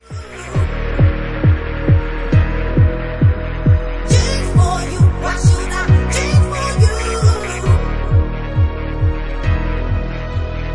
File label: Bassline Remix